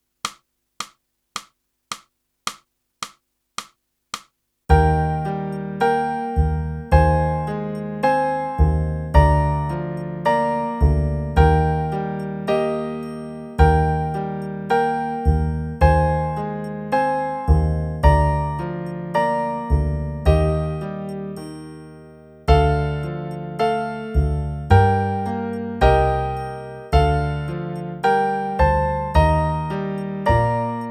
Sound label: sax